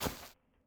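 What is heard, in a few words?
hvy step mono, heavy step; torque3d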